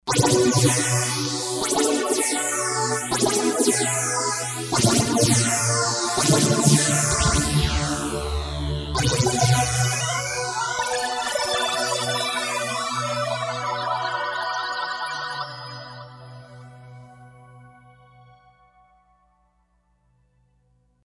Kawai K5000S

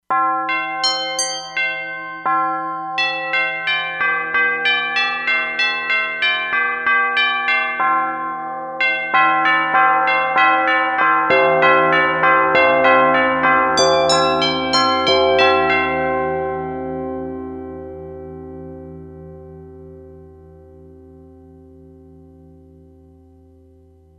Tubular Bells, E-mu Proteus-2; Factory Presets

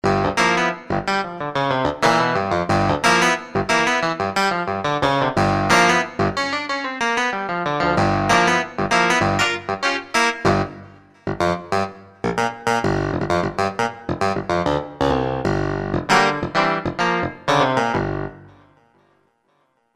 HYPER CLAV; Akai SG01v

